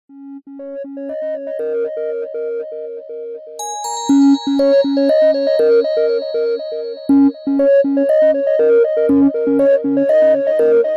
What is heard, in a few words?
apple iphone 11